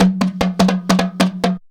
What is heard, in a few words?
JUNKU RIFFS; Best Service; World Colours